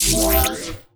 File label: sparks portal Open; netent